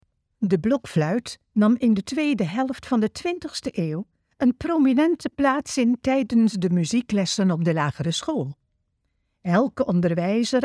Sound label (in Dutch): blokfluit; kast 11; enhanced